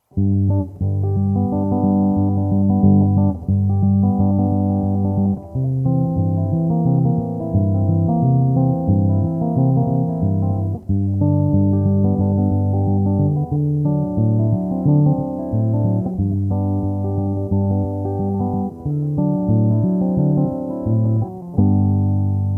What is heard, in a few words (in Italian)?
Ritmica country